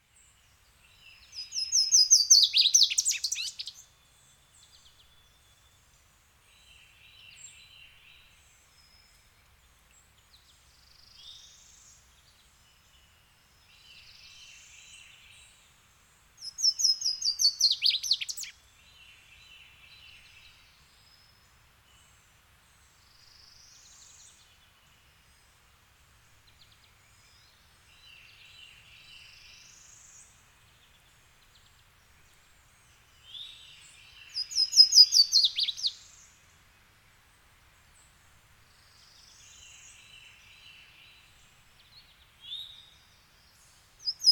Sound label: nature woods ambience birds close and in distance